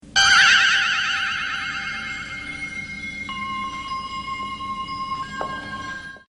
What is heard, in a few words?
Ring